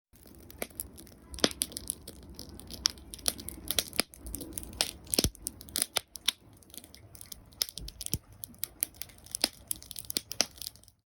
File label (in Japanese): takibi on